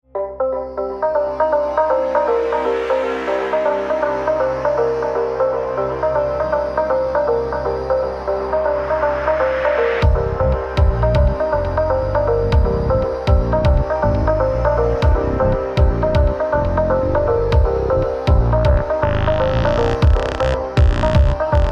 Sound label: Drum N Bass; Risk of Rain